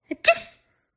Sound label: human FEMALE sneeze